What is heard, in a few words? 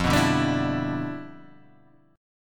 G 6th, F, chord; G6/F strummed